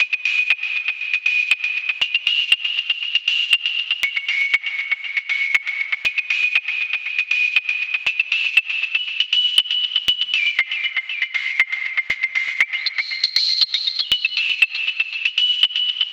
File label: Thai Loop